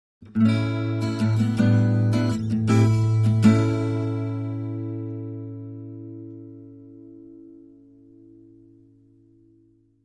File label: Acoustic Guitar Happy